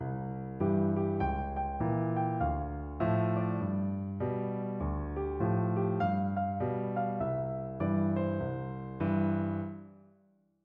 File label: Stride Piano